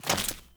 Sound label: Sprout Farmer Default Step, Steps; Characters; Ambient; Sprout Farmers